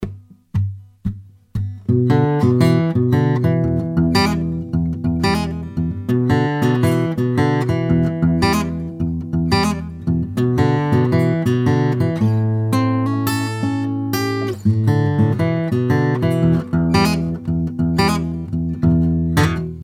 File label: close guit